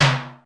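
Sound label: GATE tom; Crypton; DrumBase5000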